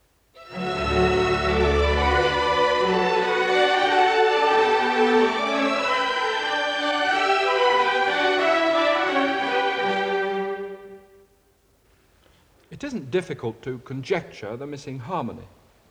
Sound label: Music Example (Orchestra)